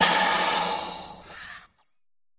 Hydraulic valves release air and steam. Valves, Release Air, Pressure Hiss, Steam